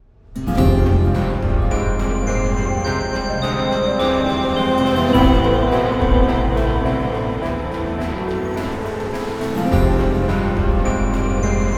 contemplative; Joe's Diner

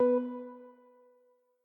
Quasar Move B

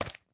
paper grab

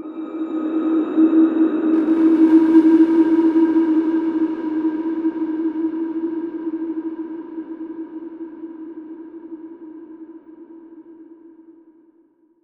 System Drones - Dronetail; 3030 Deathwar Redux A Space Odyssey

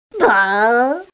Bwah; Rabbids Go Phone Again

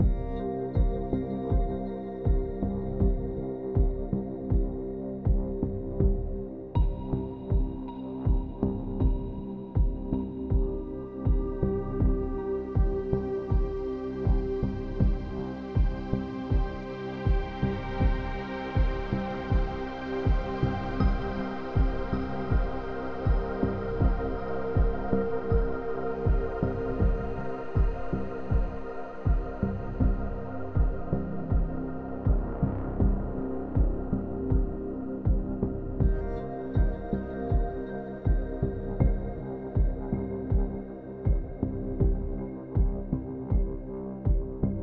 Ambience 80BPM; Downtown ActAll

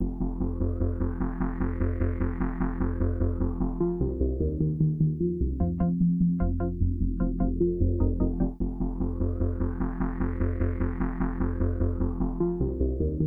Music Menu Vote Loop; lego brawls gamerip 2022

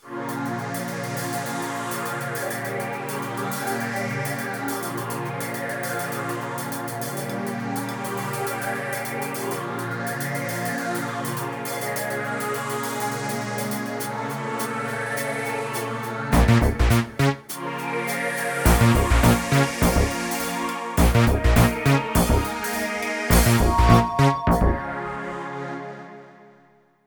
Ambient; End Less Ops Ambient 3

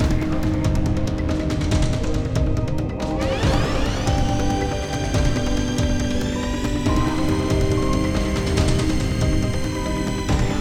Marauder Turn

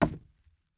wood land

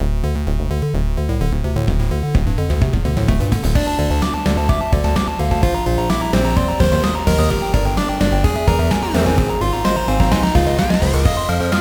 Game Over (Long); Dead Ops Arcade 2

